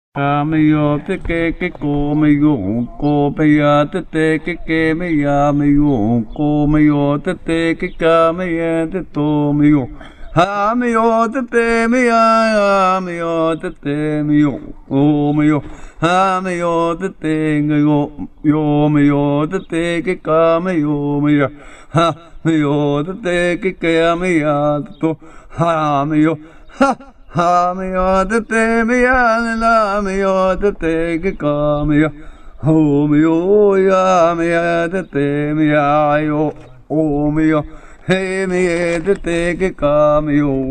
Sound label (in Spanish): canto del tecolote; kiliwa